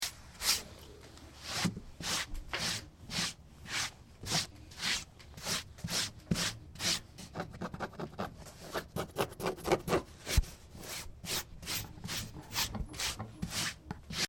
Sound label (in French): gratter une peau